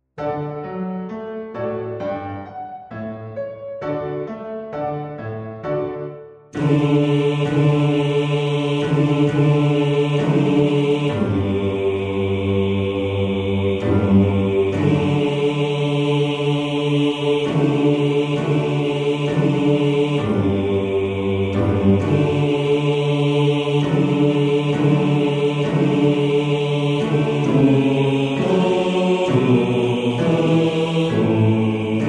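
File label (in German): Bass 2, Männerchor; Christenglaube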